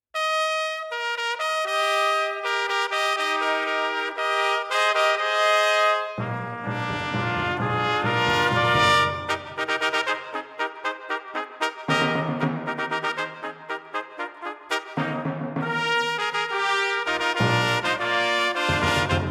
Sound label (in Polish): fanfara; fanfara ujd